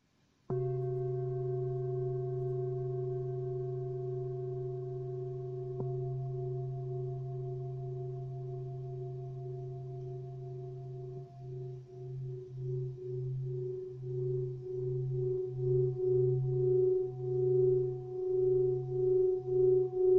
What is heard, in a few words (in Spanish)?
planetario sol, cuencos tibetanos